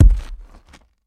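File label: Rock Dirt Heavy Dead Thud; rocks & dirt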